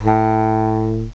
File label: SAX, REEDS; CMI Sound Library Rev 1.3